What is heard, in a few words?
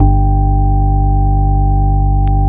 Bass Analog & Synth; STARTRON; USB Soundscan vol.12; AKAI